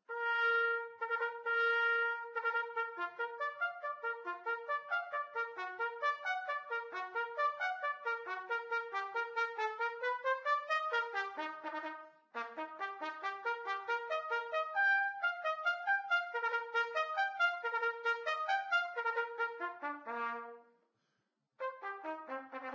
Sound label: Trumpet; Zomboid; Lifestyle